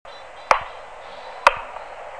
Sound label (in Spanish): Asio otus Clap; rapaces nocturnas